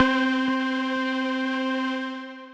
Synth Keys

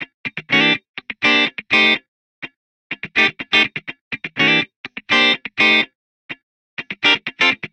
Guitar Funk Cmaj 124bpm; Guitar and Bass; Ableton; Factory Packs

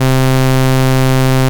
Telephone, Effects; Ableton; Creative Extensions; Poli

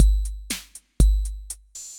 Kit 606 Gnarly Crunch, Effected Drum Kits; Drum Machines; Ableton; Factory Packs

